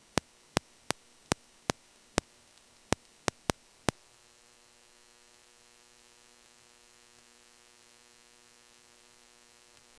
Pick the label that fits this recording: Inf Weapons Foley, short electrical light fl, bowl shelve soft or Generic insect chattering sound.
short electrical light fl